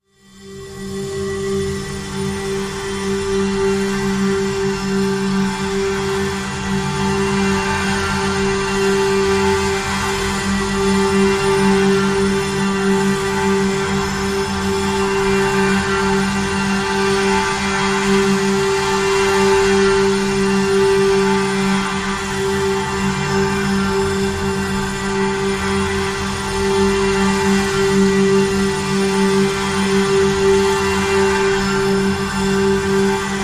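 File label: Drn G, drone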